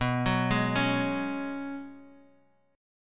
Gb Suspended 2nd Suspended 4th B

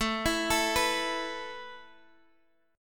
A Suspended 2nd A Guitar Half Step Down; Asus2

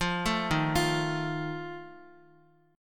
Eb Suspended 2nd Flat 5th, Guitar, chord; Ebsus2b5 arpeggiated; JGuitar

